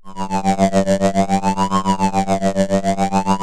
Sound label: LFO SYNC G2, SYNTHS; SE-1